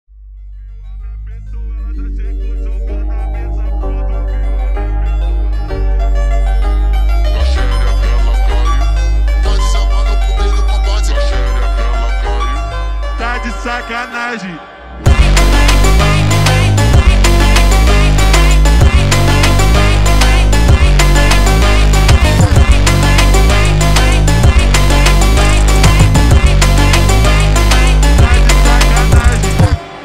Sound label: Phonk